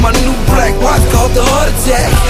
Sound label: heartattack